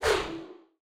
Ui Default Window Close

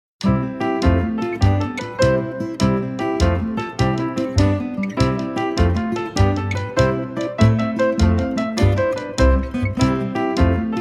Humpty Dumpty Kids; The Sims 4

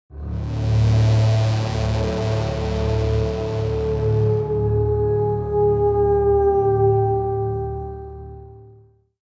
Medieval Foreboding; Civilization Revolution; Xbox

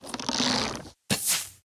Diraqiarcher Attack

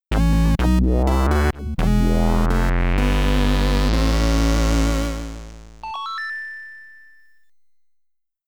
Stage Clear (SID Stereo)

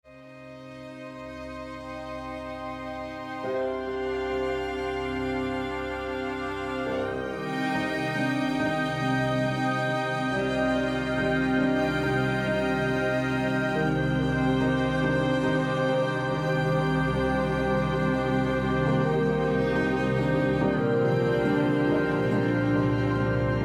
Sad loop

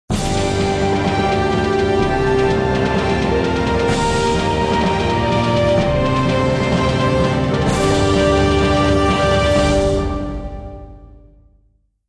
Medieval Combat; Civilization Revolution; Xbox

